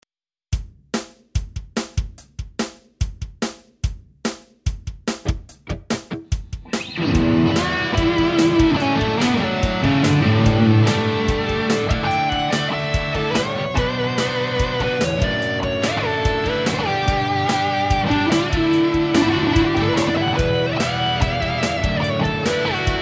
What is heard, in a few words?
guitar